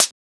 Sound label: Closed Hat